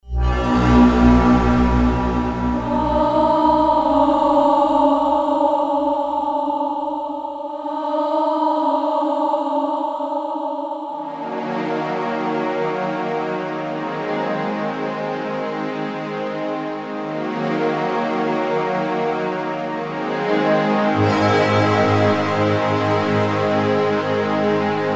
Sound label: scary movie